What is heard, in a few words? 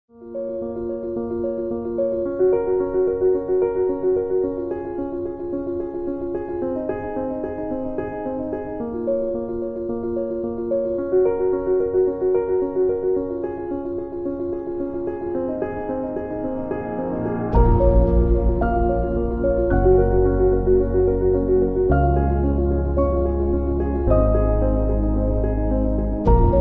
Motivational Background